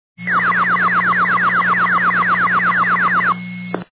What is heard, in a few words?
siren tones